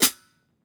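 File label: closed hi-hat; eddyflux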